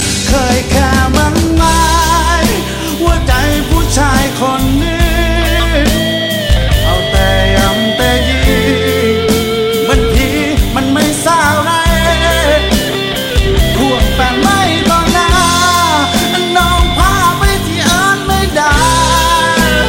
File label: Thai Song